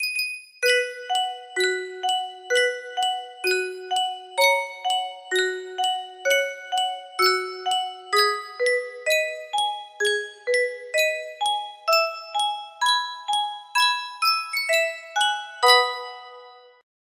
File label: yunsheng music box